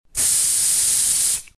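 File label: sounds of aerosol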